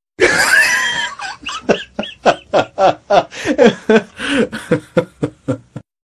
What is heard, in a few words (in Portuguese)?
risada